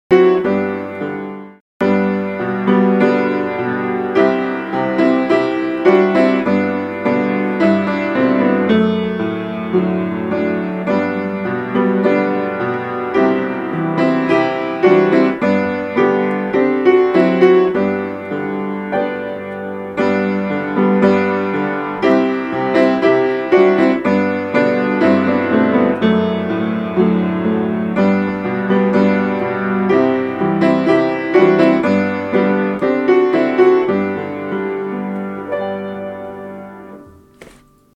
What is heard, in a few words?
Deep and Wide, piano; Deep and Wide, Deep and Wide, There's a fountain flowing Deep and Wide